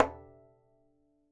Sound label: Violin; Unusual